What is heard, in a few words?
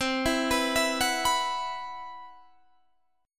C-Major Sharp 11th, Guitar Drop D